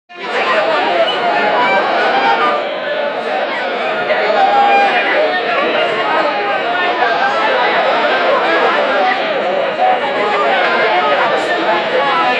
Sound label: a hubub of an excited